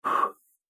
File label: Human Noise Man Blowing, breathing; Andrew Fleming